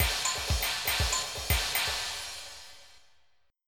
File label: samba